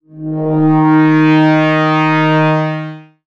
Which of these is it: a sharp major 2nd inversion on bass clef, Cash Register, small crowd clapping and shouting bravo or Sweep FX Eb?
Sweep FX Eb